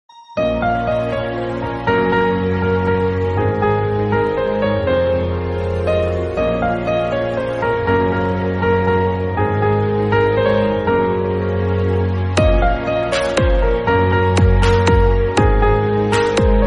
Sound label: mother background music; kgf 2